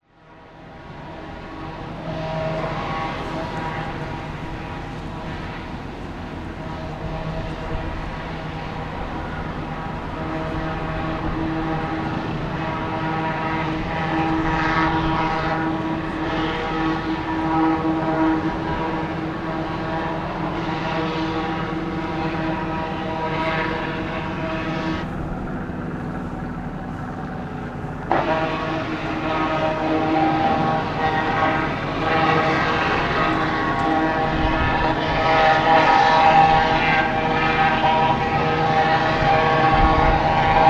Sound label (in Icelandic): Vigri sandblásinn